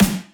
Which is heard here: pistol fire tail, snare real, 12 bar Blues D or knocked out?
snare real